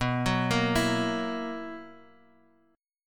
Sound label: B Major 7th Suspended 4th, Guitar Open D chord